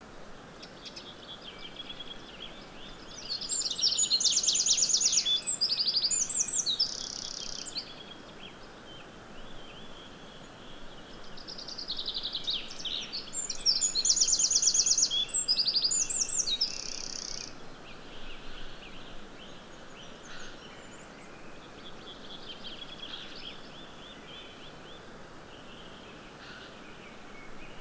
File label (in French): Troglodyte mignon, Issaux